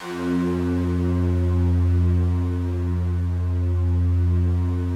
FOGATK2 F1, Yamaha VP-1; Music Store; 90's Sample CDs; FOGATTACK 2

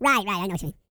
E TALK, Vocal Planet R&B & Dance; ILIO; Vocal Planet VOL-4

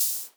M500 HH, Drums; Best Service Dance Mega Drums; MAESTRO

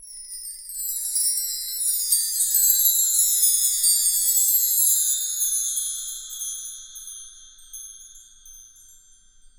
PRC Wind Chimes; Roland LCDP03 Orchestral Perc

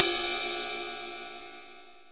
mallet crash, Roland R-8

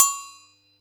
Yamaha EX5, Drums

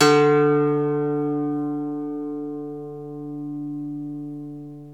IRISH HARP

